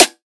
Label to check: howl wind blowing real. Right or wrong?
Wrong